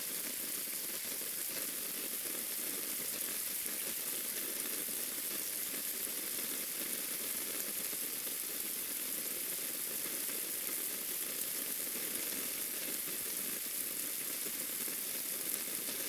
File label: Lawn Sprinkler